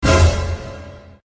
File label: dramatic boomer